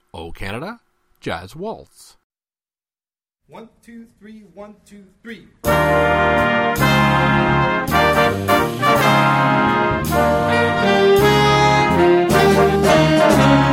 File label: Jazz Waltz; O Canada